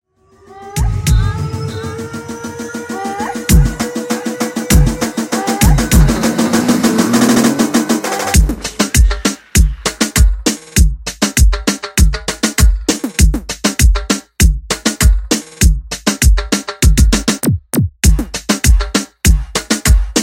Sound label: Drum Tool Sound